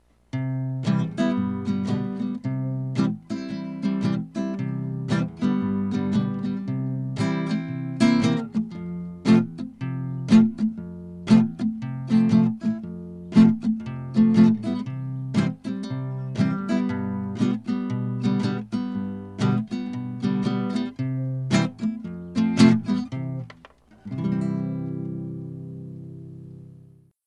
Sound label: guitar